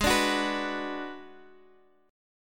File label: B Minor Ab; Bm/Ab strummed; JGuitar